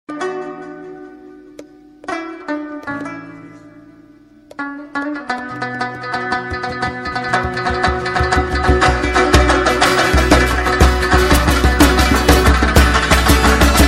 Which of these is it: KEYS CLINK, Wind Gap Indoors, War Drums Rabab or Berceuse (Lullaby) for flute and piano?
War Drums Rabab